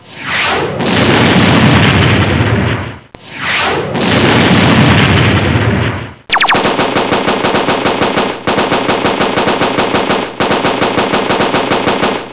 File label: war, Gun shots